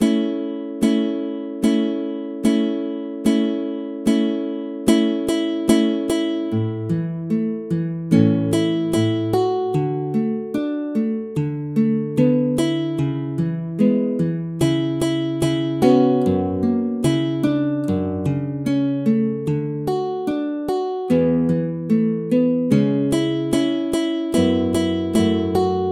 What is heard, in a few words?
synth